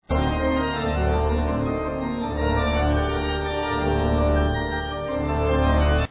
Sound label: fugue d minor